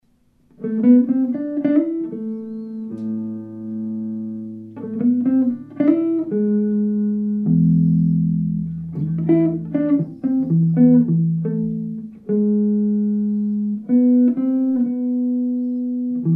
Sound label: primitive jazz